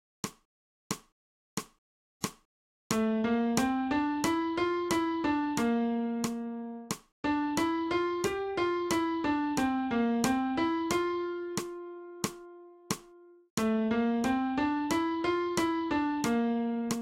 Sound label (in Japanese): 新フレットレス